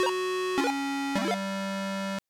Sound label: lose; GlobalGameJam; Rise Of Blorbs